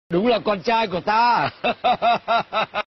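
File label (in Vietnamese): meme tieng cuoi, laughing sound; nguoi phan xu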